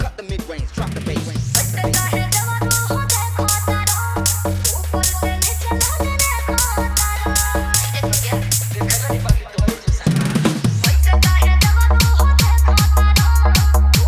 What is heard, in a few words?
Bhojpuri Dj Remix Songs Edm Trance Mix